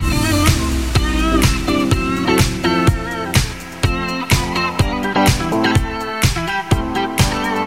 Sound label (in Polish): gitaara